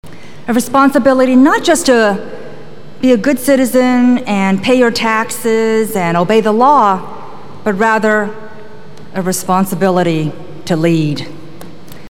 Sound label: lead